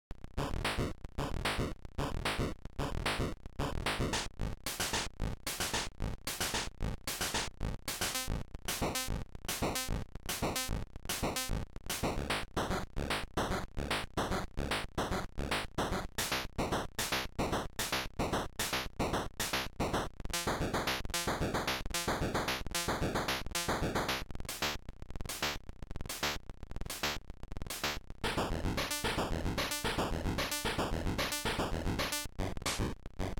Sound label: lullaby noise test